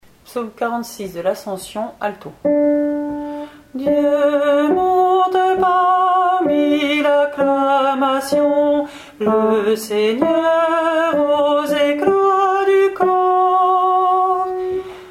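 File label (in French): Psaume Dieu monte parmi l'acclamation Alto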